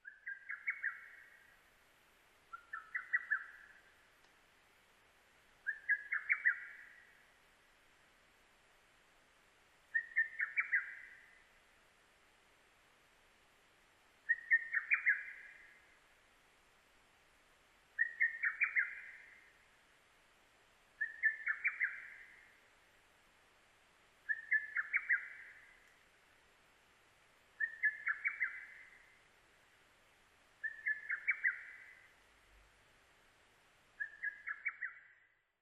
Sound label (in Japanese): hototogisu